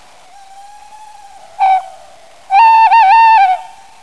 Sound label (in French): hulotte, oiseau; Le cri de la hulotte; Chat-huant; Elle s'appelle aussi Chat-huant. On la reconnaît à ses yeux noirs et à sa tête ronde.